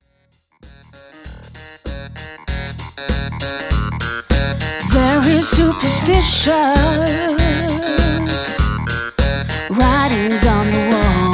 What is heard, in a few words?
SOUL BEAT; Soul Beats